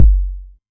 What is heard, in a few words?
808 Kick; Novation Drumstation V1